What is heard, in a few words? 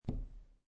Poker Chips One Chip Dropping On Felt; Gambling Sound Effects; Casinos